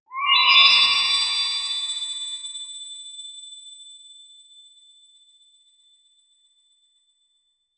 mmorpg fantasy

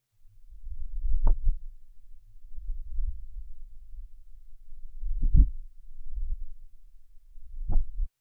prayer ambience

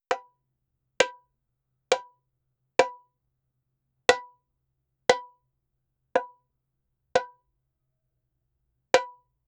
the sound of two sticks

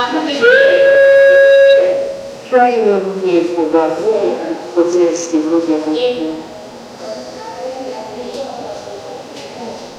footsteps running on tile; babbling

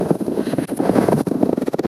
large snowball rolling th, snow

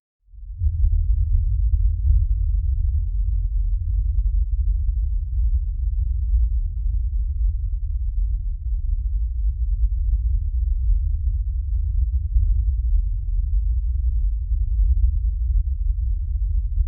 ambient open ocean water, deep water

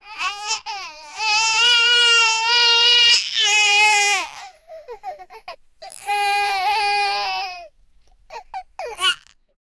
baby crying from distance; misery

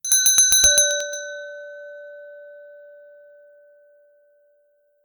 a sound effect, confirmation

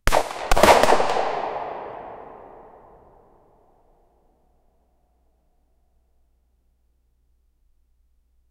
colt python 357 magnum, small room

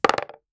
single pinball impact

